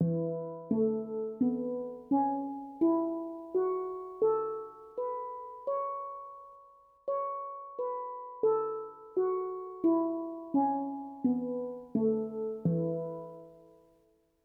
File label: E Pentatonic